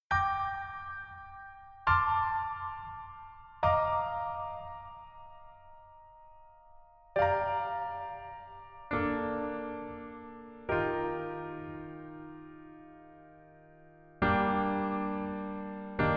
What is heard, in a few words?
Piano ver